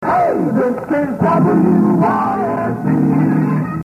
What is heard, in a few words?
jingle; WYSE